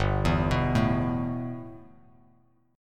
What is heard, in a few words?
G 6th Add 9th G Bass Standard, chord; G6add9 arpeggiated